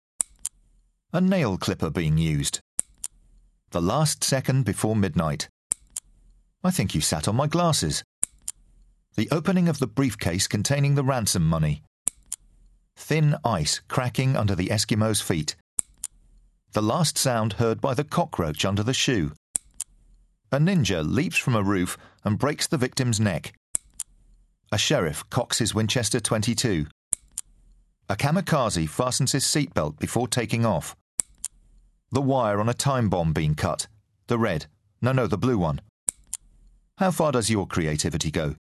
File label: NAIL CLIPPER